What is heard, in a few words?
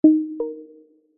multimedia alert prompt feedback tone simple sine chime 2 tone